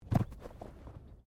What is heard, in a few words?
Body movement Tumble Light; Body movement foley to simulate falls and deaths; Rolling; Objects; SoundEffects-Foundation1